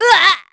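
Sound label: Vox Damage, Korean; heroes; Glenwys; kingsraid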